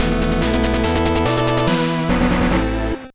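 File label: Jingle, video game; Serious Sam Advance; GBA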